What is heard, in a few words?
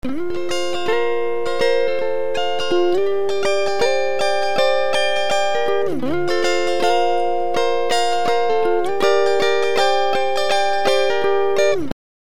Pitch Shift perfect fifth, Raised perfect fifth; Up perfect fifth